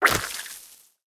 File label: SFX Fight Attack Spittle; mighty party 2017 gamerip pc ios android